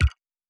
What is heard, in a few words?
click